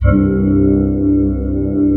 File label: BEAM C2; Q Up Arts; Dream Experience